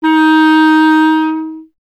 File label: clarinet D#, Solo Instruments; Miroslav Vitous - Solo Instruments VOL-1; 90 s Sample CDs